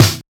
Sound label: focused acoustic snare sound g sharp key; snare drum samples